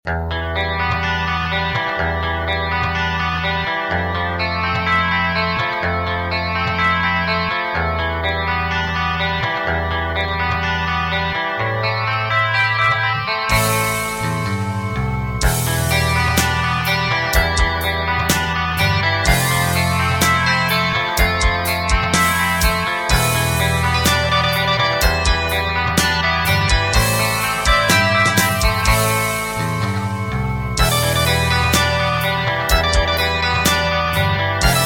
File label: guitar fantasy; inkwizytor